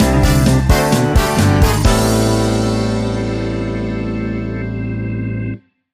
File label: Funk Vibes Stinger